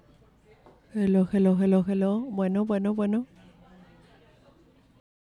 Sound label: Audience Recording; a-meal-premiere